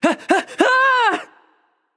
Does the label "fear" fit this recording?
Yes